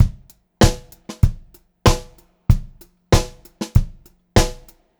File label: BEAT, Pop-Rock Drumloops; USB Soundscan vol.37; AKAI